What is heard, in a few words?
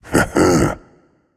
heh heh; smite; anhur